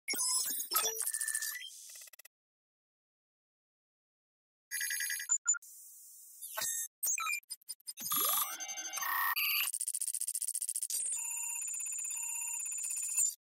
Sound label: Digital text